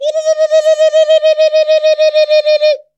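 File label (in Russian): странный мужской крик долгий индейца далекий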